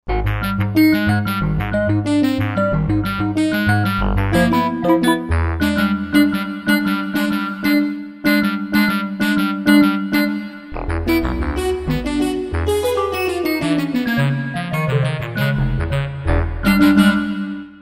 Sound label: Wave Tables, Korg Wavestation A-D